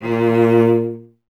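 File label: string cello A#, Solo Instruments; Miroslav Vitous; Solo Instruments VOL-1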